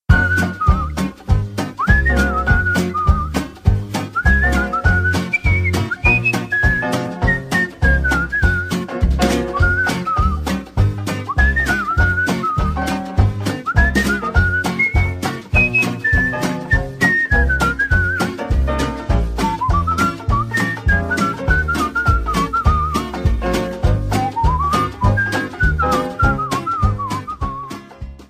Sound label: CHILL WHISTLE